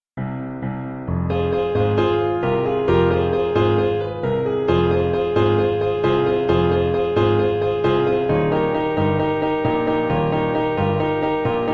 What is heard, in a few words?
accompaniment; mamma mia vocal audition materials